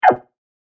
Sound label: Laser Strike Arcade, laser gun, science fiction sound effect; Andrew Fleming